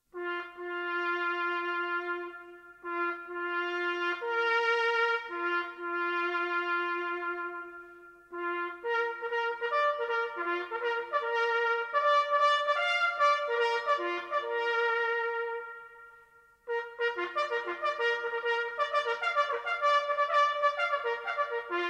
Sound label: reveille; Bugle Calls; Anzac Portal